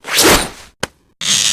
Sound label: arrow